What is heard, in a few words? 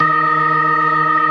51 STOPS, Synth and Organ; InVision Interactive; Keith Emerson; The Most Dangerous Synth and Organ; ALL STOPS